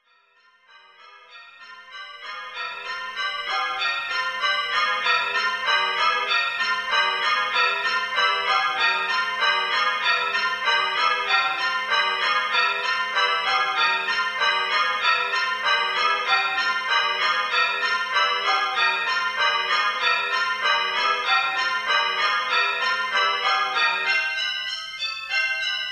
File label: Perpetuum Mobile (For eight handbell players)